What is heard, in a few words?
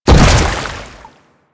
Land Water, Footsteps; Enemies; Warframe; Grineer